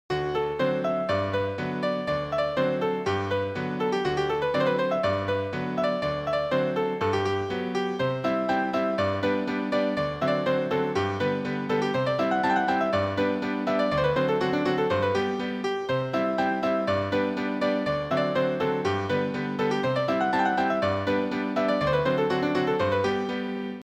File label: Krakowiak